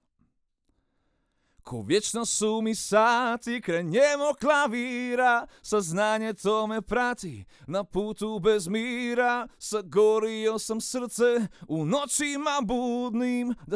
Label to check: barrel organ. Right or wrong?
Wrong